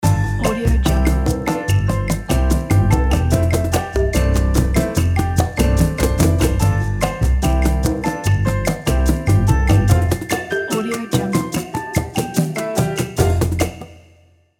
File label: rumba logo